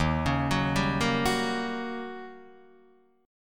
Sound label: Eb 7th Sharp 9th, Eb, Guitar, Open D